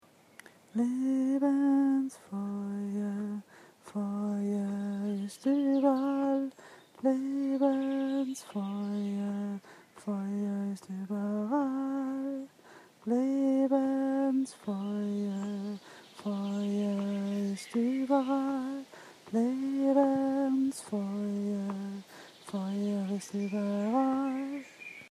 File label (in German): Lied Bassstimme